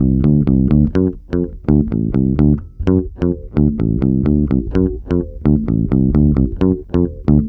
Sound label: BASSE, Roots Disco; USB Soundscan vol.32; AKAI